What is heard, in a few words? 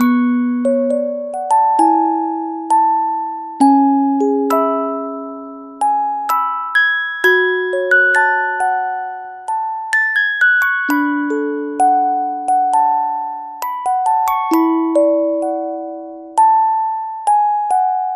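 keys music box; loops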